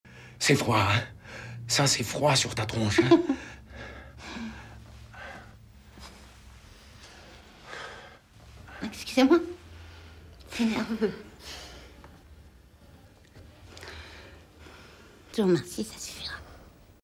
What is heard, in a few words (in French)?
Rire